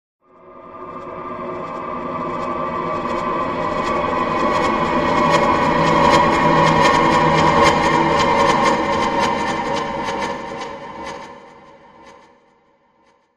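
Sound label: Bed Ascend Fluttering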